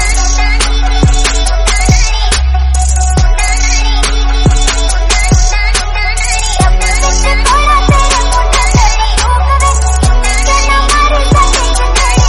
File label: BGM